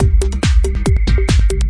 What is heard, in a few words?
140 bpm, full techno, drum loops and breaks